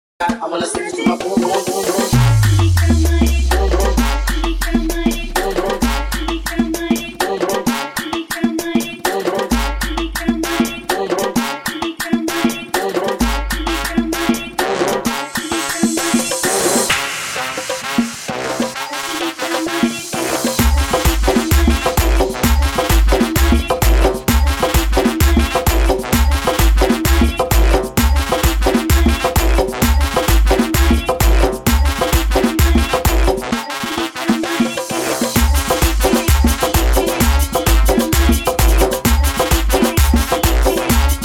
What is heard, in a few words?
ARABE GUARACHA 130 BPM